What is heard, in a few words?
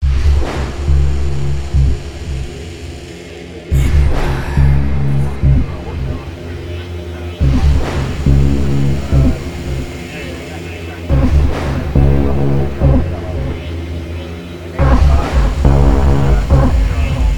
Hardstyle; Gabber